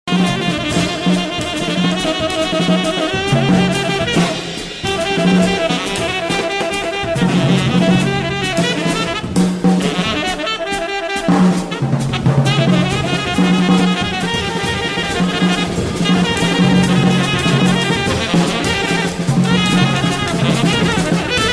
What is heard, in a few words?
Drum Sax Impro